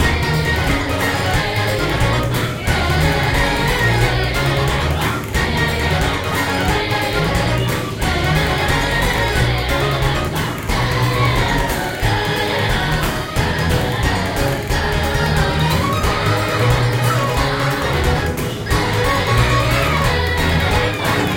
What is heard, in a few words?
level; goldentavern